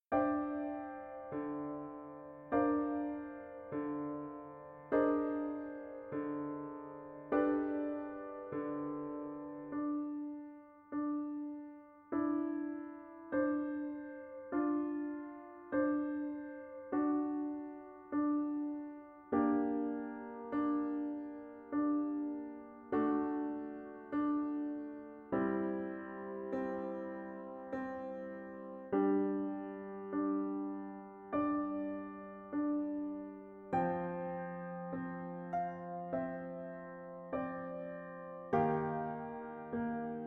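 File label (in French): études pour piano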